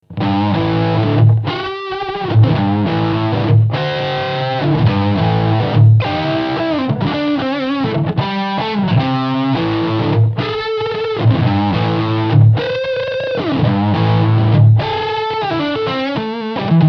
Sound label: neck lead